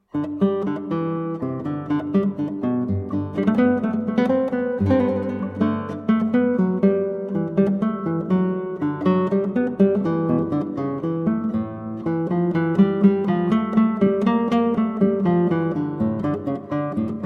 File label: Suite 1 in G Major Gigue, 8 String Guitar; Bach Cello Suites; Johann Sebastian Bach